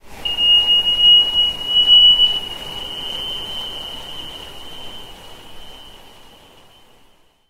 Sonar Sweep; Barotraumatic; Carrier Leviathan

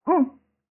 female drown; Barotrauma; Real Sonar

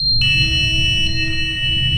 sonar Ping Water Medium Beacon; Barotrauma; Real Sonar

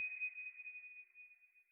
sonar Tail Water Far Directional; Barotrauma; Real Sonar

